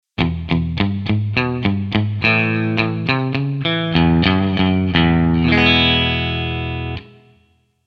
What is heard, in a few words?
guitar studio reverb